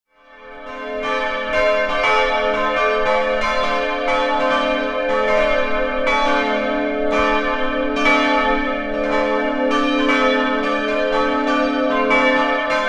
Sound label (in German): Glocken Mono; kg thun straettligen; Kirchgemeinden